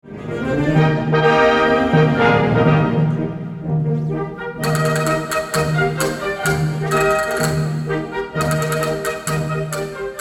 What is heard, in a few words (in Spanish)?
marcha española; spanischer marsch; spanish march; op 433; para banda